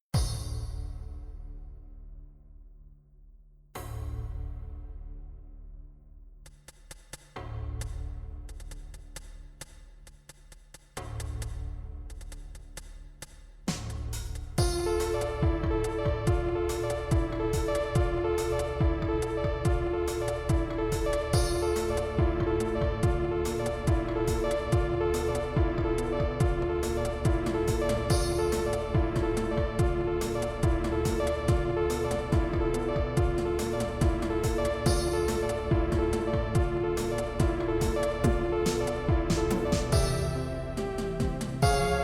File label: Ambient ver.